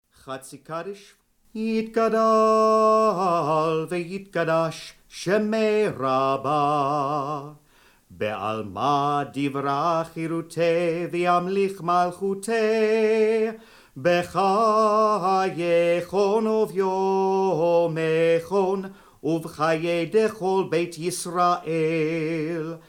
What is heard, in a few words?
Chatzi Kaddish; Religious School; mitkadem